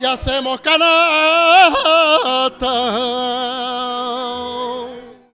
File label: FLAMENCO VOX; Best Service; World Colours